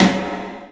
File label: PA DEEP R, CLUB SN VRB; 3D Sound; 3D Drums